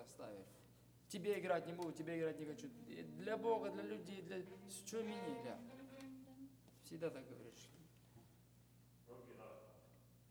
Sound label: Overhead